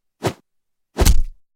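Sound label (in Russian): звук бейсбольной биты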